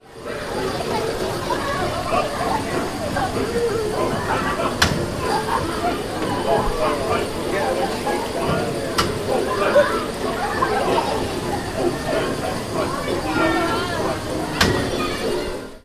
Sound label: children's ride